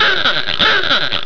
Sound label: ahoohga